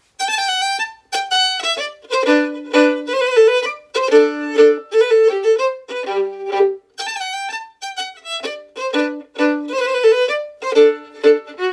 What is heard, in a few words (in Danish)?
mazurka; Lars Oles mazurka